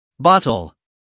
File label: bottle